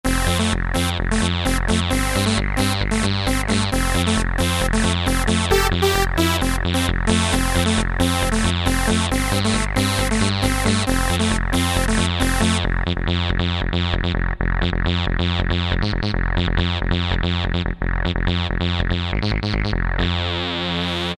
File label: Rave Saw, Alesis QS8; Factory Preset examples